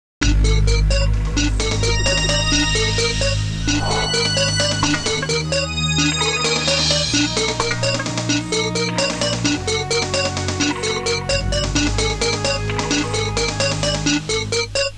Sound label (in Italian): musica elettronica; electronic